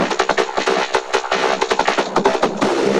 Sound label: Vocobeat; Sonic Foundry (Sony Creative Software)